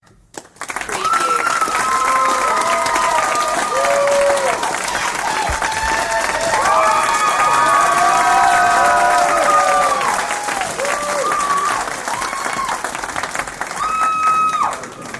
Audience Applause; beanstalk audio

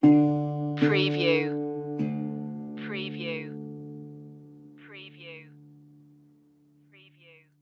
sand and rust stinger

